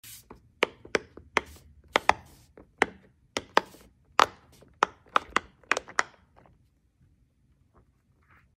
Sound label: Puppet Fidget